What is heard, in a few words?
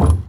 Garbage Can Perc, percussion; Houston; Moody Park